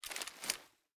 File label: Pistol10mm Equip Up Fast; ARMES